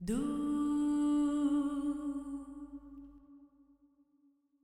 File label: Girl Sings Duuu